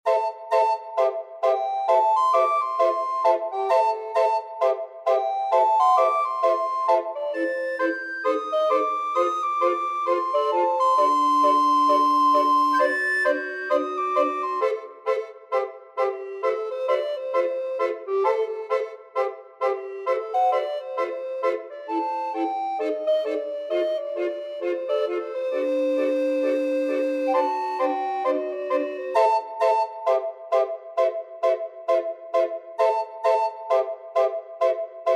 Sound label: bob cat, recorder ensemble